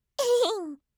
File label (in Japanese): "ehehen"; kanjou